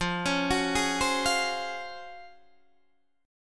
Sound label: Db Major 11th F